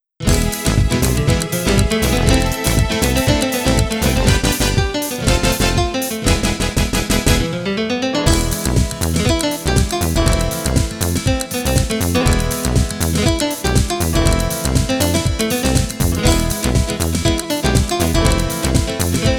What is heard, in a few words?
Violent Flamenco; tokimeki memorial 3 yakusoku no ano basho de